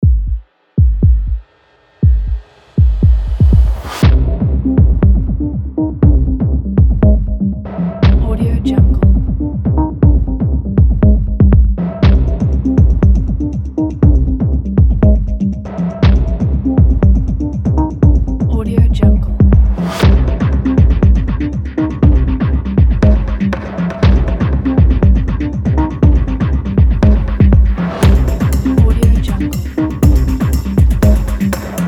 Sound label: tension